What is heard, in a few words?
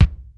METALKIK, DRUM; BEAT2000